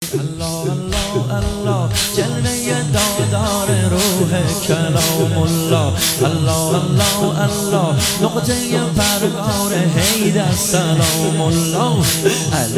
مداحی

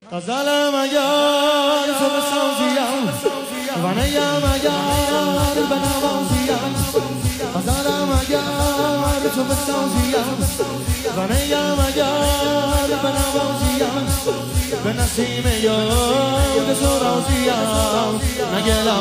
مداحی شور

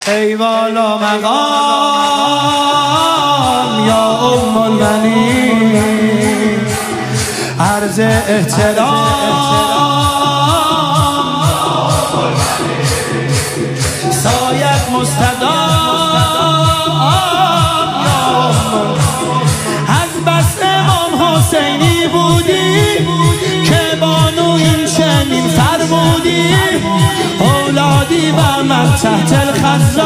مداحی

